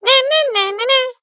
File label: Stand leg poke Sfx